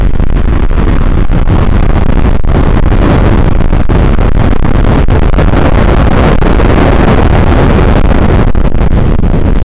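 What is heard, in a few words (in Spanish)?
explosion que no se escucha, distant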